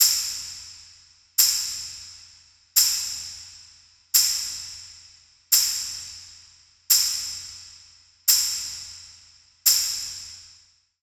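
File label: Tambourine Loop - Am 87Bpm; Construction Kits; STEMS; Ghosthack; SkyRealm; Kit 18 - Shivering Sea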